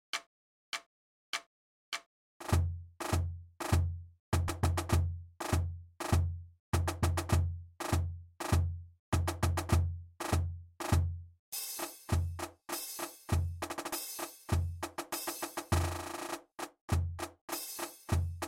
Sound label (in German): Rhythmus